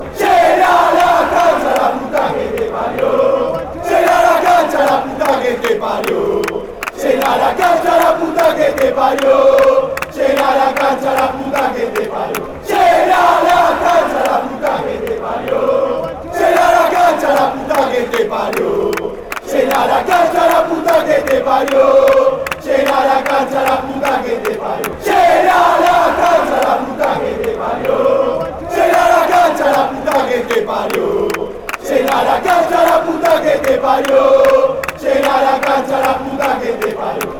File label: fill up the stadium, chant